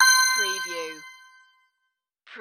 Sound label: Multimedia Alert